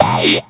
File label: Drone; Rabbids Multiverse; iOS, macOS, Mobile